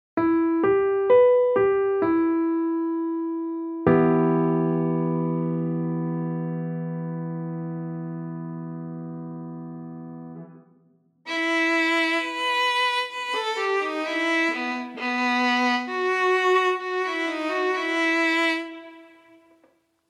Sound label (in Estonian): Viiul; e-moll